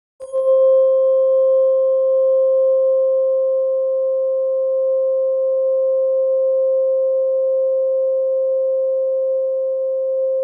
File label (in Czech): 528 Hz, terapeuticka ladicka; dna oprava; hlinik